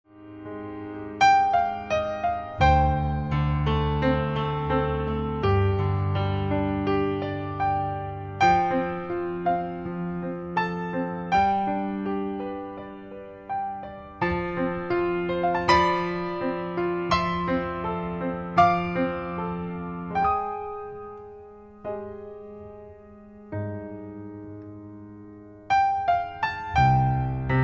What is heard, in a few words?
acoustic piano